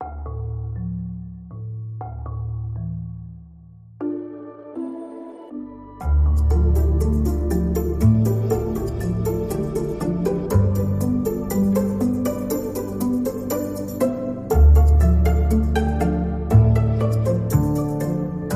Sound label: Pizz Underscore